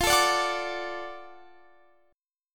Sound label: F sharp Minor Major 7th Sharp 5th